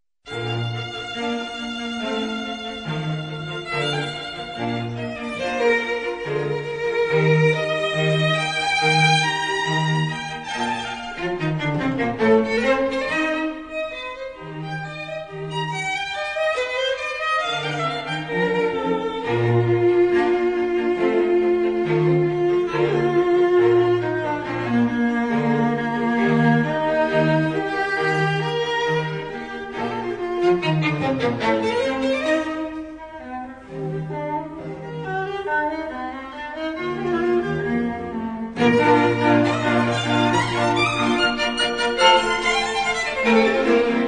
esempio quintetto

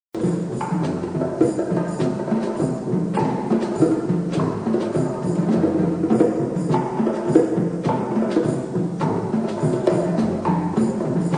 percussioni indeterminate

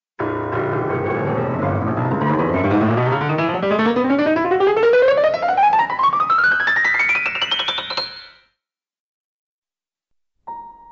pianoforte a coda

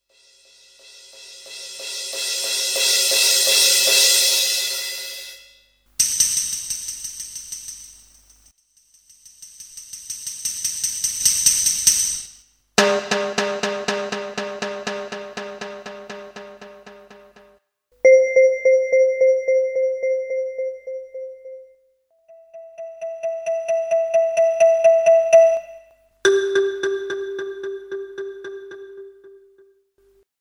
esercizio crescendo

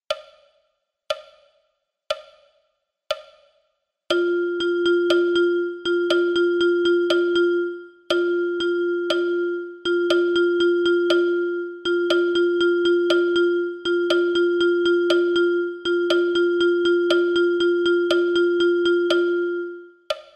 esercizio ritmo rap

